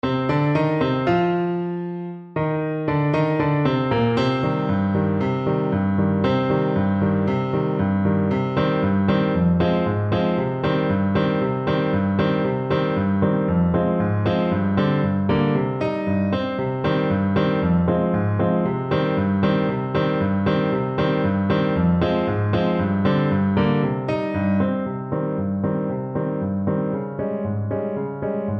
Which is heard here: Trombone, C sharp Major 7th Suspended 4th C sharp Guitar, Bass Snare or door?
Trombone